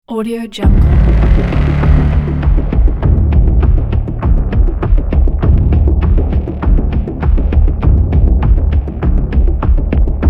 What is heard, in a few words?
the dark suspense